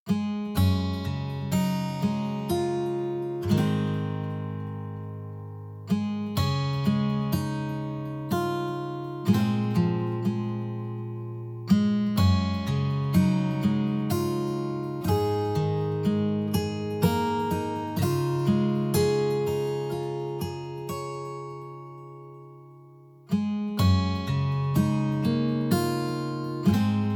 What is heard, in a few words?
Romantic; Grimlight: A Tale of Dreams